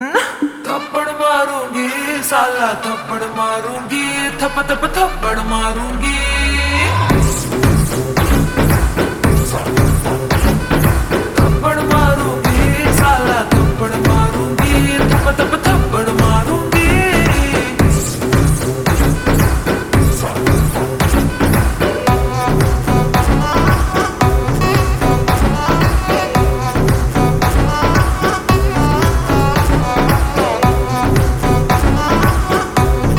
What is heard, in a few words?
Ringtone Slowed Reverb; Allu Arjun